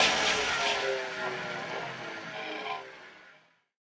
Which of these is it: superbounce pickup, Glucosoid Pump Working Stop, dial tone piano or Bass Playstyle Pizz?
Glucosoid Pump Working Stop